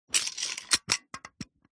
office stapler mechnanism metal movement clicks short